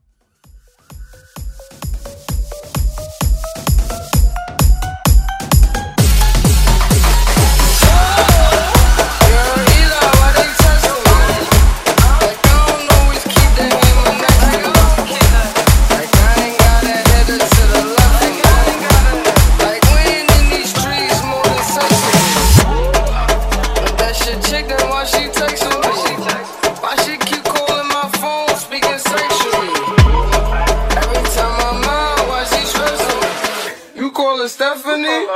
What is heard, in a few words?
Festival Sambia Mash Up